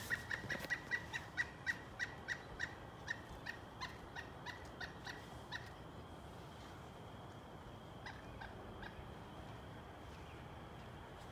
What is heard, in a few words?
pileated